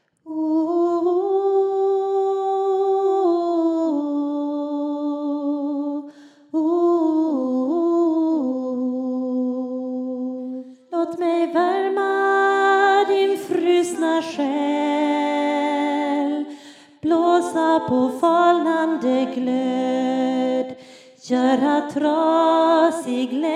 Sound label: Soprano